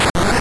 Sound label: Snore; sfx; attack moves; pokemon; gen 2; gsc